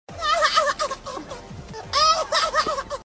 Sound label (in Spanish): risa